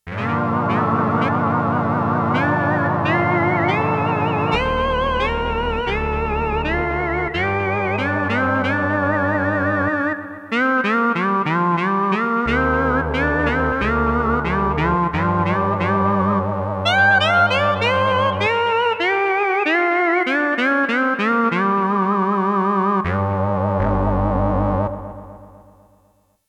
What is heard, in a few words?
EPILOGUE, Roland JX-1; Factory presets